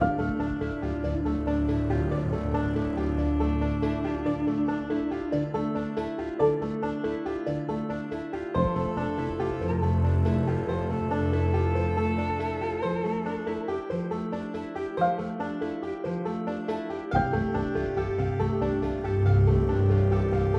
Exp Travelling Loop 140bpm 5-4 12bar; gamerip; Project Zomboid

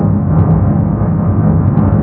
Engine loop Distance

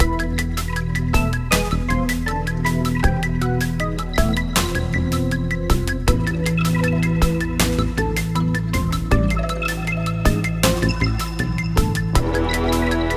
Event- Underwater loop mono

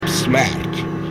SFX Die; Escape from Russia: Mobilization

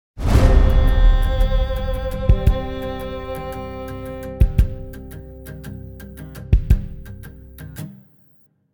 Tension Stop 113bpm 4bar; project zomboid

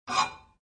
Pick Up Knive

